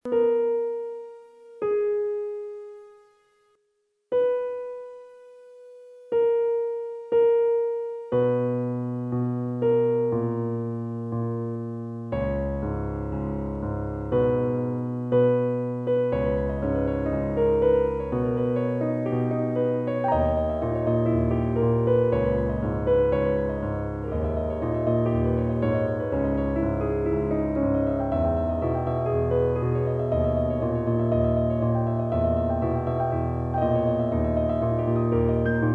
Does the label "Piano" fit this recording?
Yes